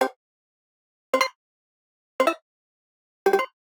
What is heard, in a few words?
Digital Click Positive